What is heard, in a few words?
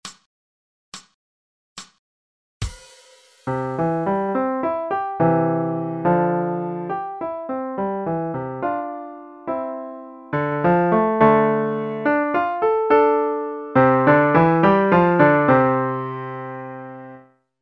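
Broken Chord Play, Pianoforte; Choi hop am roi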